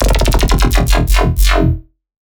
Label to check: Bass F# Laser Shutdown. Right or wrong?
Right